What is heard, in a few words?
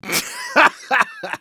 laugh short; Engineer